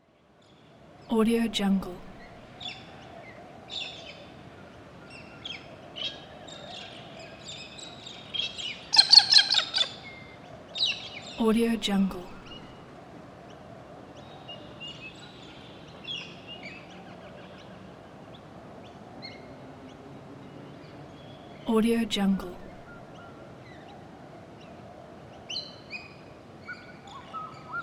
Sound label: morning forest ambience